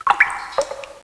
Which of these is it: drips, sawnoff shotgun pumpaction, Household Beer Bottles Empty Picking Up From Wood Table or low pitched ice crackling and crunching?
drips